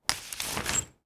umbrella

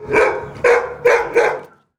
dog barking

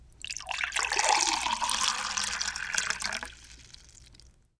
pouring liquid

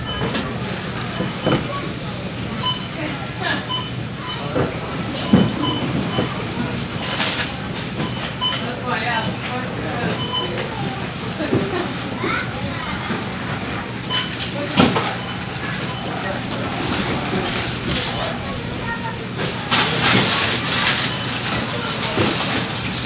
grocery store, ambience

